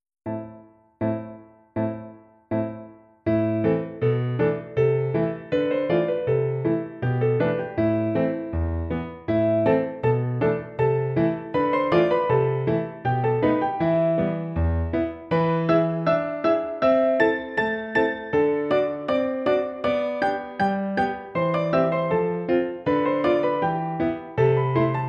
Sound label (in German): Arabisches Lied